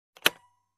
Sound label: Lock Click